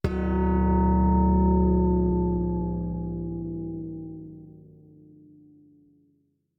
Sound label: Stingers ui objectives fail gong